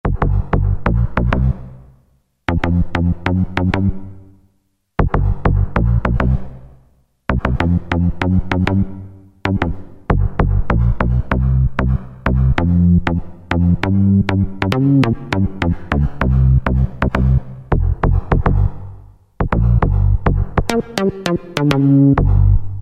KNOCK BASS; Akai SG01v

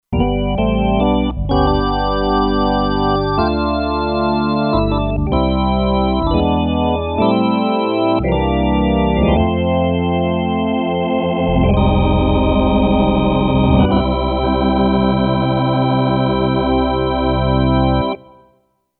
Gospel Organ, Kurzweil K2500X; Factory Programs

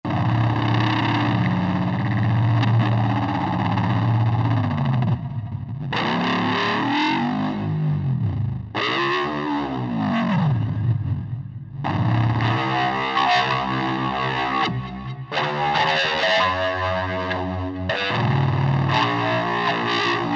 Real Distort; Special FX; Roland SR-JV80-15 Special FX Collection

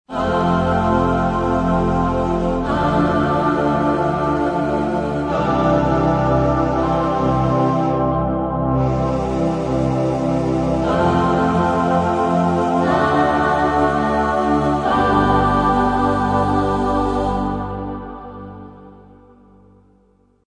Dawn Chorus, Yamaha TG55; Factory Presets

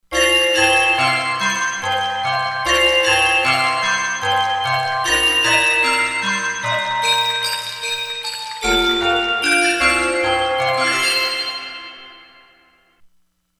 Water Bell, Korg MSC-16S; Environment

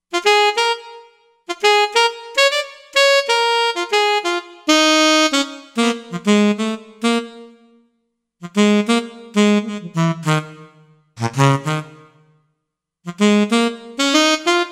Alto Sax, Roland Fantom XR

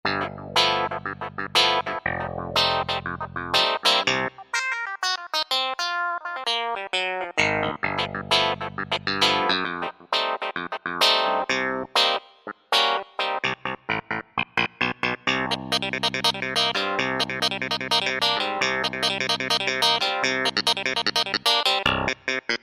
Clavi Wah Wah, Roland V-Synth; Factory Patches

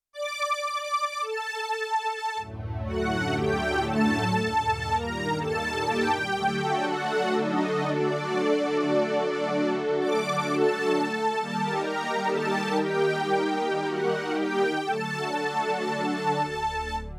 Stringensemble; Yamaha TX16W; Synth Set

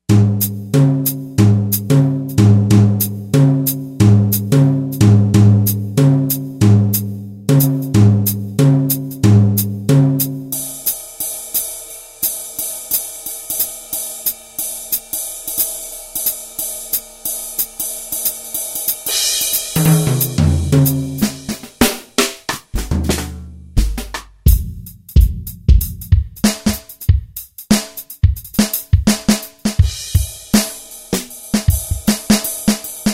Jazz Brush Kits, Korg TR-Rack

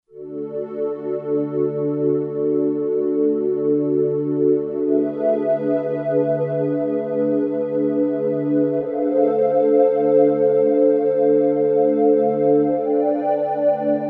Square Pad, Roland SC-880; Factory Patches

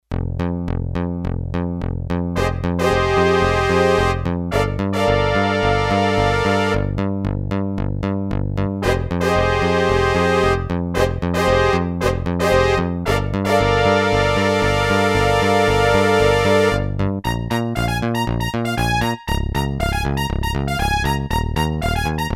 Brass Combo, Roland D-10; Factory Patches

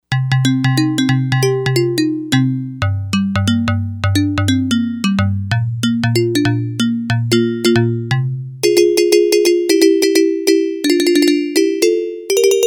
Hamarimba, Yamaha DX100; Factory Patches

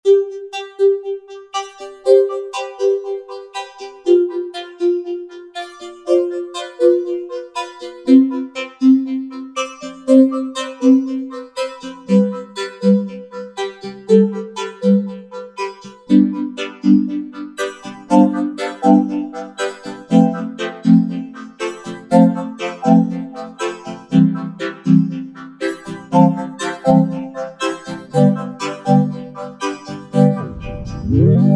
Roland V-Synth; Side walk; Factory Patches

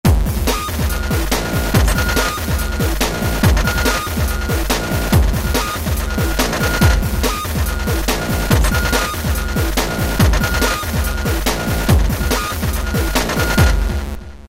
Hardcore Electro, Korg ES-1 Factory Patterns

